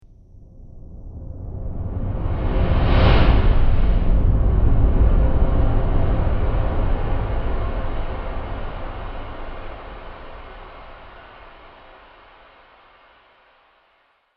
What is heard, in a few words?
Flashback Transition Whoosh